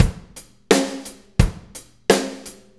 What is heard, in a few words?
4-4 Loop, Drum Loops, Full Kit Loops